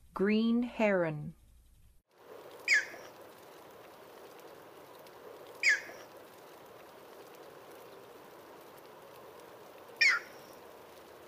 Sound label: Green Heron; North America; Western USA; Western USA Songbirds